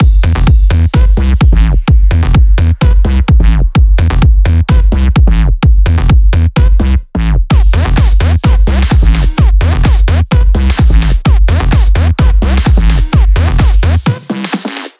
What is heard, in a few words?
128BPM Mix; Praetor Mix